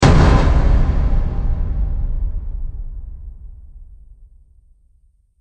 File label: Explosion Impact Hit, bomb